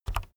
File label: keyboard external key press very fast soft